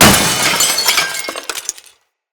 Container Open; Salvage; StorageContainer; Material Specific Loot Containers

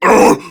Pain Prime Grineer Male; Tau; Battle Barks

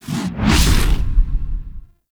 Throwable Coolant Item Whoosh; Gameplay; Warframe; Venus Open World

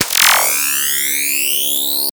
Burst Laser Charge Up; Warframe; Teralyst; Ropalolyst; Abilities

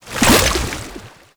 Paint Pickup; Gameplay; Warframe; Shadowgrapher

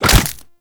Desert Skate Attack Fire

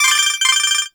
UI Tool Tip; Nineteen Ninety Nine

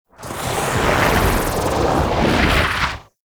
Void Angel Teleport Return Build Up; Abilities; Warframe; Zariman

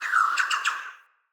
flock projectile ready; Enemy; Abilities; Thawtide Hunter; Sinecure; Ranged Hunter Thawtide

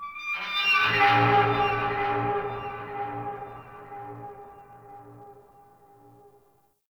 Distant Metallic Groan; Warframe; Tau

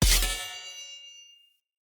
Short Sword Medium Parry Perfect Block Impact; Weapon Impacts; Player; Swords; Combat

